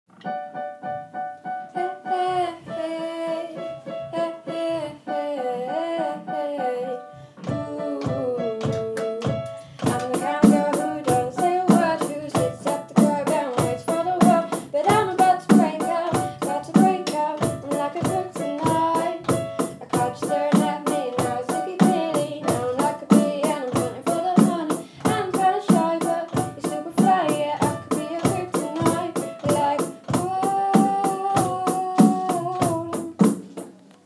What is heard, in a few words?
vocals piano and drums